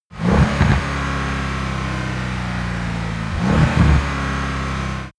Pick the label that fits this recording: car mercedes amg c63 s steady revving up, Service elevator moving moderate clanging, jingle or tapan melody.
car mercedes amg c63 s steady revving up